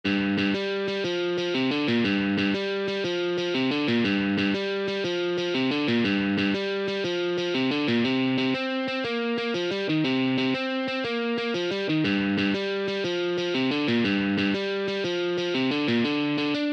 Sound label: Shuffle in G